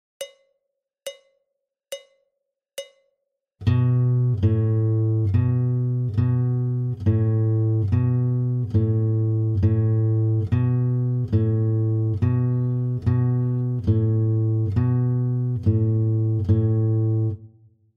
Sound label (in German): Warm Up H tief, gitarre